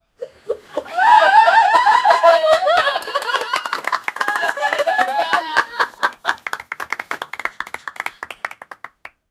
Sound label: royal people laughing